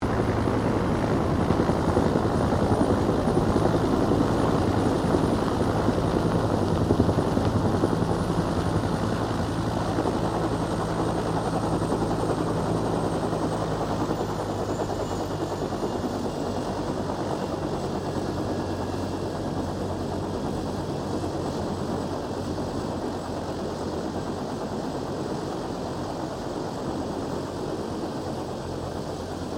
HELICOPTER BELL UH1 IROQUIOS LAND; RAN UH-1 Iroguios; Helicopters (Sound Effects:Vehicles)

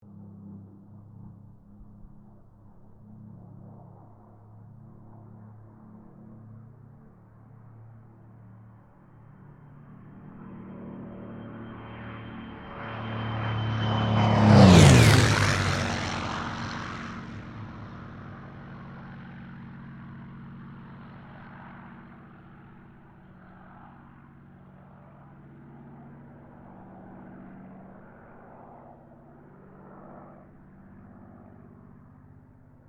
AIRCRAFT SUPERMARINE SPITFIRE MK-XVI PASS

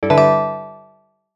chime arpeggio full; Multimedia; Chimes; Blastwave

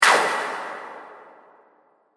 Impact Sharp Reverberating Hit; Miscellaneous Impact Sound Effects; Impact / Explosion Sound Effect; Andrew Fleming

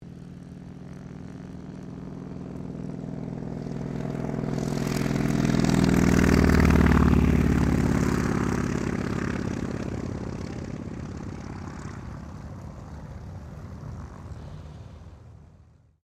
AIRCRAFT CAC WIRRAWAY TAKEOFF; CA-16 Wirraway; Airplanes (Sound Effects:Vehicles)

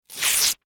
Office Paper Tear Rip; Paper Sound Effects; Paper (Sound Effects:Materials)

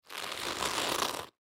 Halloween Mask Turn Head Fast Creak Rubber; Holiday; Foley; Blastwave

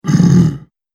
Orc Grumpy, Monster; Horror; Monster Sound Effects

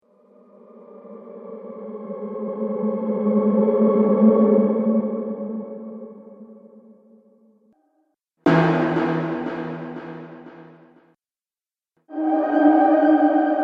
Haunting Horror, Ghost Sound Effects

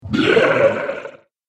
Troll Scary Growl, Monster Sound Effects; horror

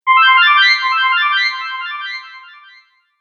Fantasy Magic Accent; Magic Sound Effects

